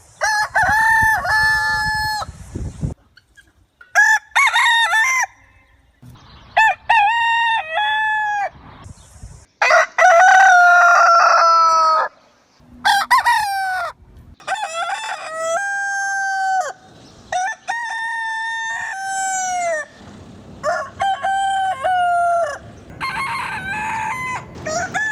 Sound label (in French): chants de coqs